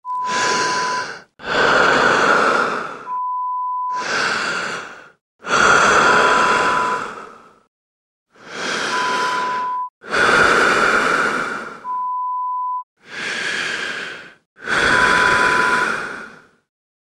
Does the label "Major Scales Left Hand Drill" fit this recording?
No